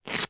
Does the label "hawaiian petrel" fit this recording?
No